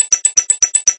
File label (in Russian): bistrij hod steklyannih chasov